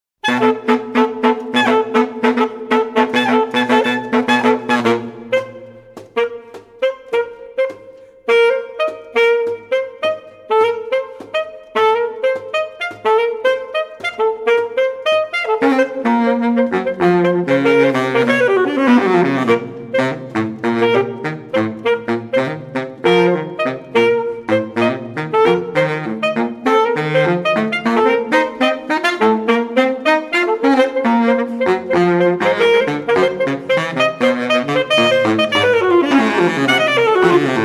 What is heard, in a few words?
morse code pop